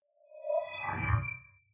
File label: swell short haunted; sweeps-swells